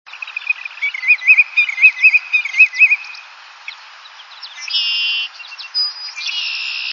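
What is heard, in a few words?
willets with red-winged blackbird; birdsongs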